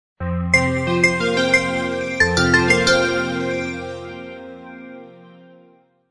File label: bell